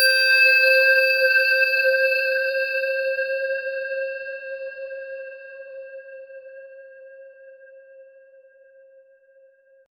pure electronic tone, sine

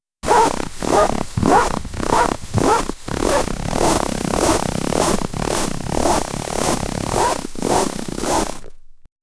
high speed snow sprint; sprinting

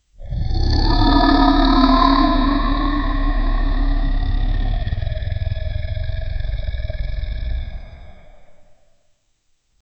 dragon roar hovering

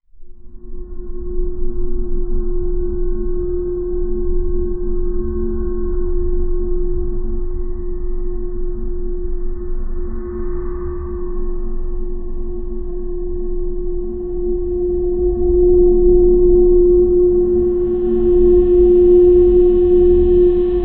futuristic sound logo; corporate

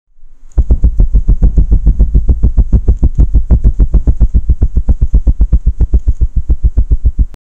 furniture shaking during, earthquake

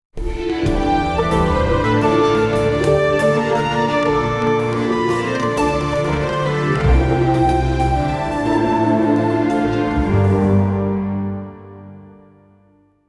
classic musicss, classical

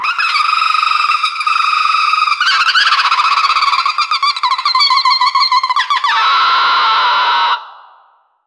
mechanical cybernetic bir

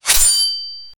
fast sword slash sharp ai; action game

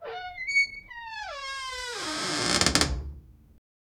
metal door creaks; doors

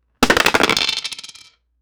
dice

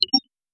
soft and short boubly pix

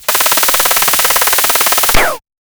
electronic

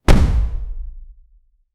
short impactful, melee

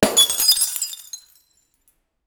breaking glass in a big

